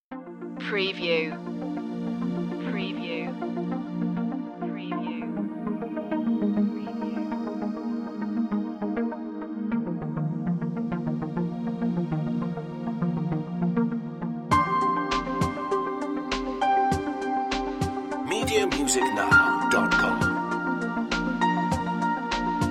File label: ad style